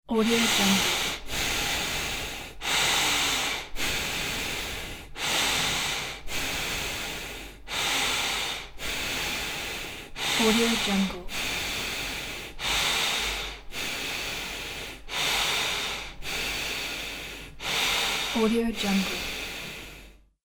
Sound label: breathing through nose